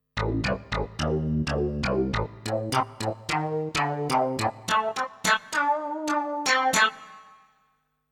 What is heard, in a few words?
Metallic Rez